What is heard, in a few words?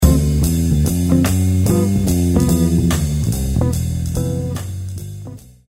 swing long C